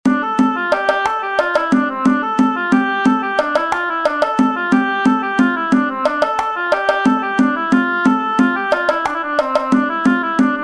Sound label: Laride gavotte; Aminlevez